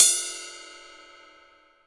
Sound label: ZIL, 20 ZIL RIDE